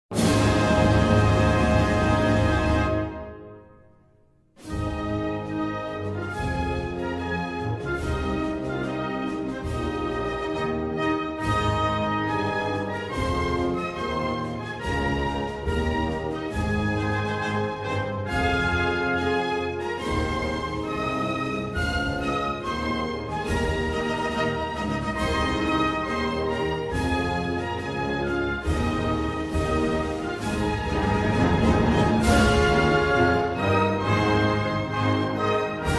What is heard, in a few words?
National anthem of Mongolia instrumental; 2006 official